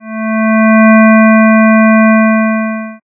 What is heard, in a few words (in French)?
clarinette 220 hz